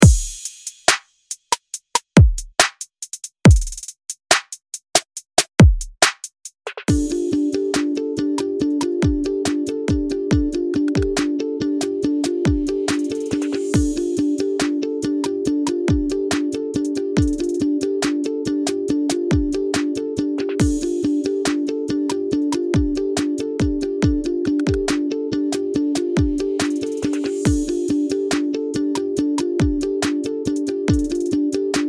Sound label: I love to dance instrumental